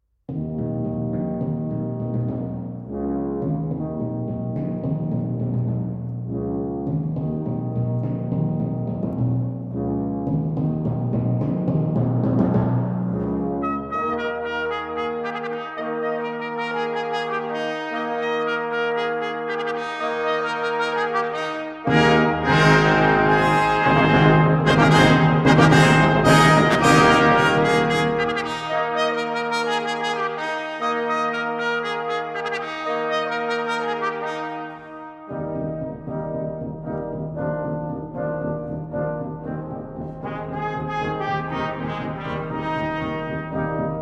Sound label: Brass